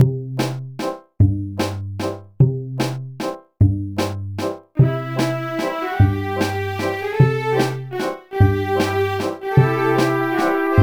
Waltz

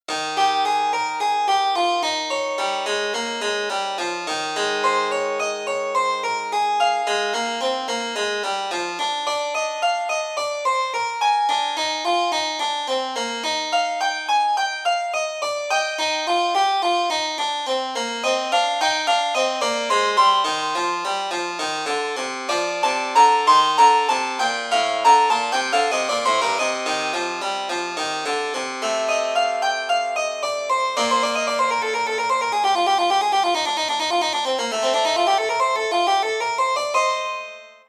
Jukebox Harpsichord

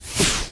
WHOOSH Air Very Slow; Infernal Radiation

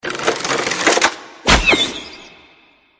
Soldier Crossbow

